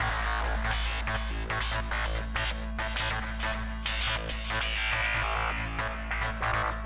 SPACE SYNTH 140 A; SpaceSounds

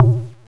BONGO; KillerTweaksII; DirectWave

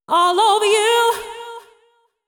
deep house female, 125, A, vocals

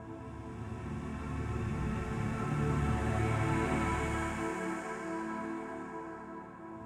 SPACE PAD 140 A; Keys; SpaceSounds

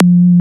BEEP; ELECTRIC-SET

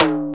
TIMBALES

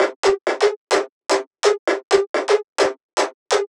perc 128, Electro percussion loops; Anthemic

